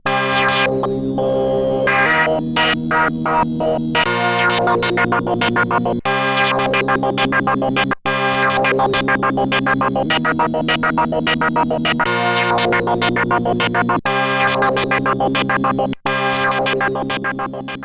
Studio Electronics STE.16 FM

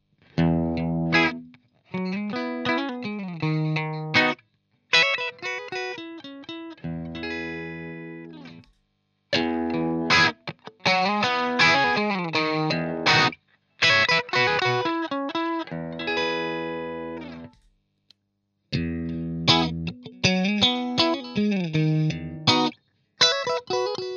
Screamer Riff; JHS; CH1 postitiv 12 Tone 9 Gain all positions

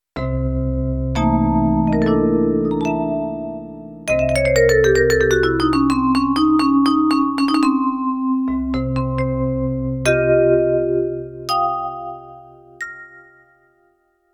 Roland Vibra

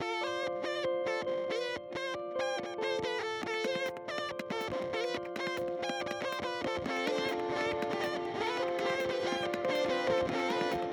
Pigtronix Space Rip

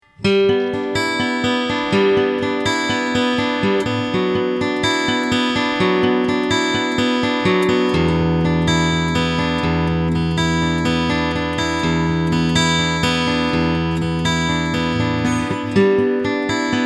Takamine FT341 Picking Mic

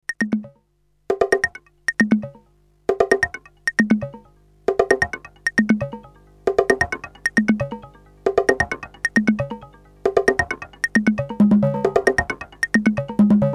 Delay Ring

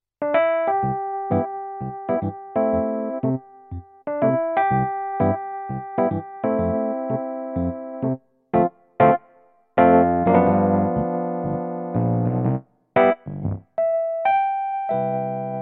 Roland FP 60 Rhodes

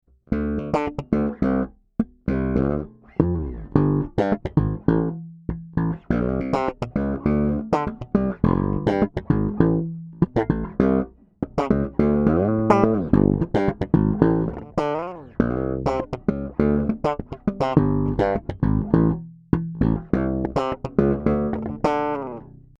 Harley Benton BZ 5000 Bridge PU passive Slap

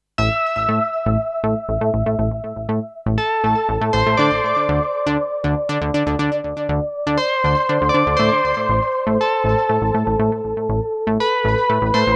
Novation Summit Presets Multi

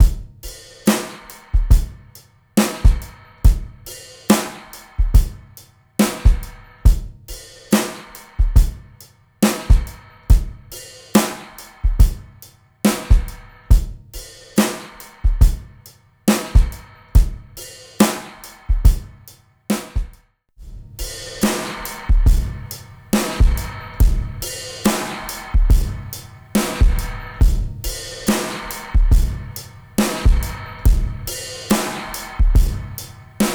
squeeky kick pedal

